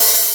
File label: sparkle open hat sound f sharp key